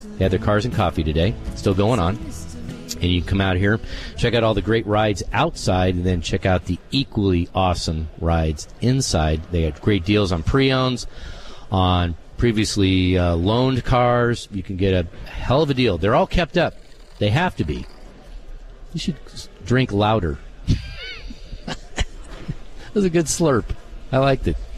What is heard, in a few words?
coffee slurp